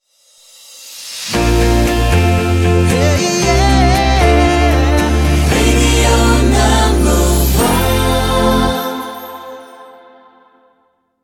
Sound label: Short Mixout